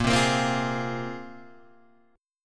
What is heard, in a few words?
A sharp 7th Suspended 2nd, Guitar Open G chord; 8,5,8,5,6,6; down